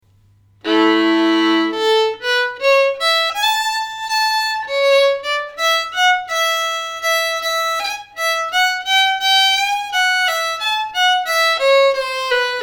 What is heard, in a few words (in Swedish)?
slow